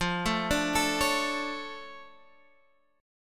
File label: D Minor Major 7th F